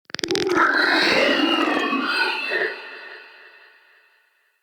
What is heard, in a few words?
Shrimp Vocal Alerted, Creatures; Baliq Shrimp Glade